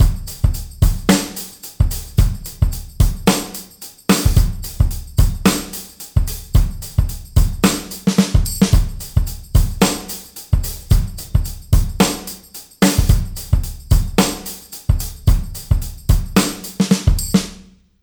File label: delay manglers semitone riff dry; aas; objeq delay